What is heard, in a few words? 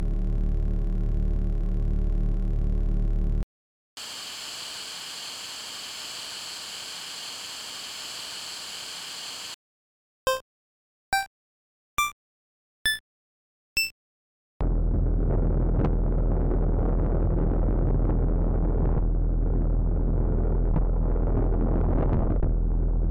Ambience and Misc SFX; Yo-Noid 2 Enter the Void